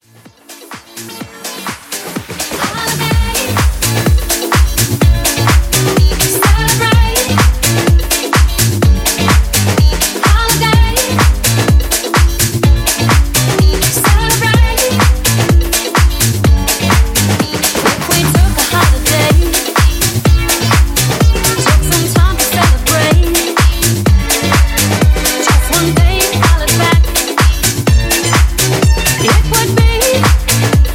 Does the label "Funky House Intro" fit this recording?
Yes